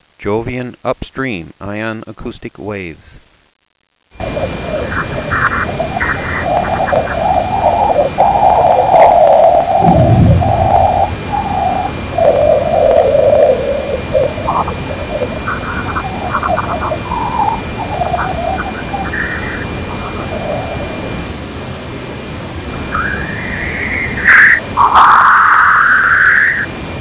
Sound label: jupiter ion acoustic; uiowa